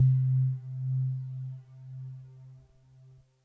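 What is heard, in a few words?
sine C2, Yamaha VP-1; Music Store; 90's Sample CDs; NICE SINUS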